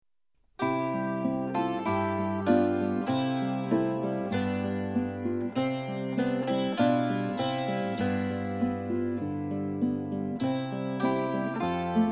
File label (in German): Alle Jahre wieder Saitenmusik; Friedrich Silcher